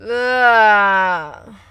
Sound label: disgust